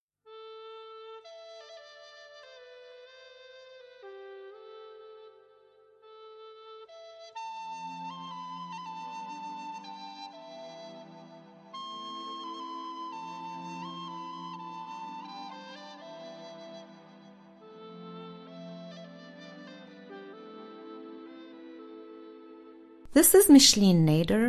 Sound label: Exercise